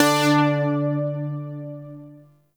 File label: Shawzin Oct Two D; Zariman Shawzin